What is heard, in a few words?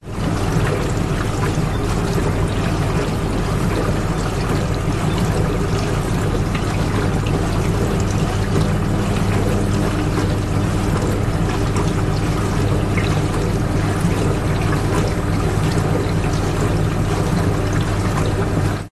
household dishwasher operating water splash drip inside; zapsplat